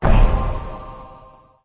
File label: bonus Hit; bloodsuckers2